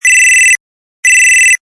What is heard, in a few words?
Bleep, Rings; Danger Hiptop-Slide